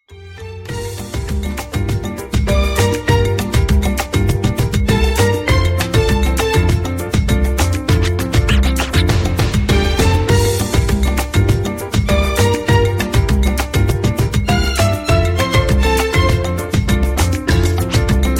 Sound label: Samba Ringtone